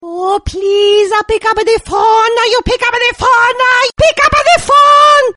italian pick up tune ringtone

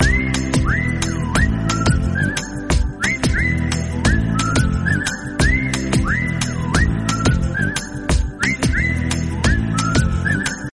cool whistle ringtone

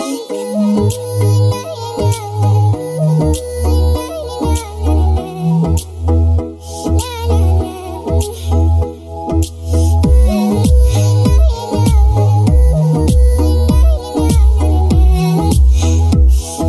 english music ringtone

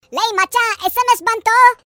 tamil sms ringtone

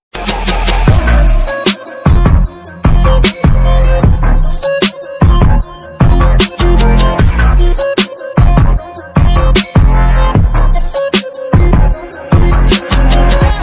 instrumental remix

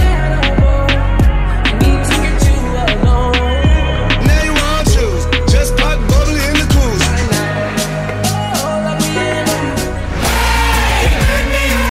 cool ringtone

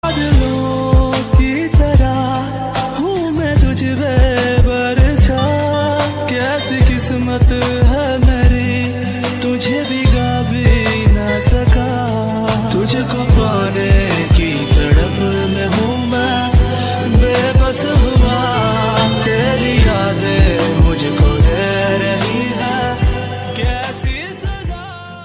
sad ringtone

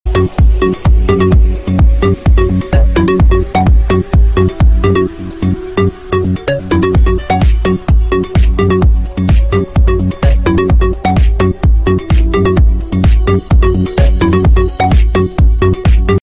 samsung s8 default bounce ringtone

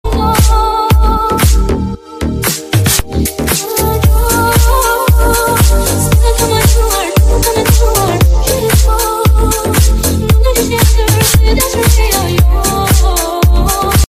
iphone arabic deep ringtone

